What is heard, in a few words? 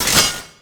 Pickup Armour; Pickups